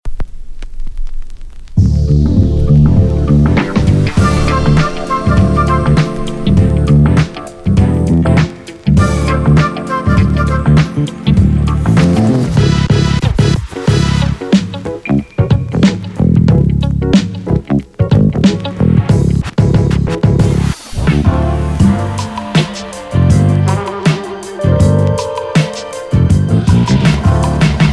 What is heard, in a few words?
Hip Hop; Black Octopus Sound; The Golden Hip Hop V3; Audioflair